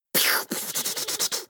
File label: battlecry; hat kid; a hat in time